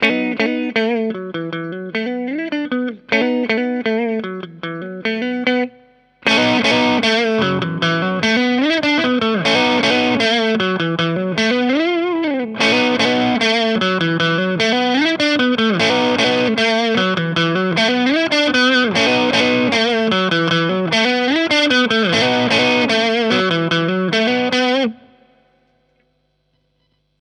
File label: Overdrive; Boss OD-200